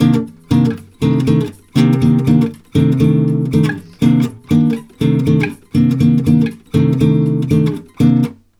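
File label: 120 guitar C minor, Brazil Bossa; USB Soundscan; AKAI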